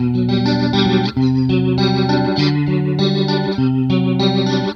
rotary, Hammond

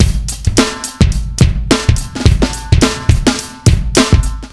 Funky, hammond riff

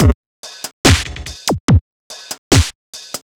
Crunch

